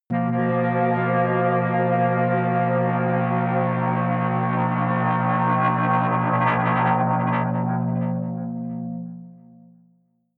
atmospheric feedback synthesizer long high, drones; musikelemente